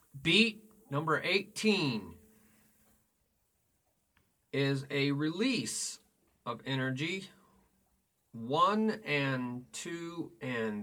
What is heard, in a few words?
Beat 1 2 3 4 Toom Ba Cha Ba Ti Cha